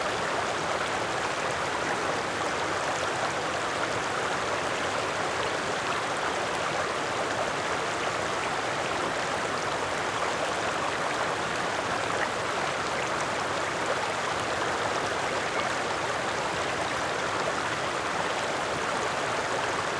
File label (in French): Ruisseau Haut Plateau Stereo Ortf; LESCUN; Geoffroy CANTOU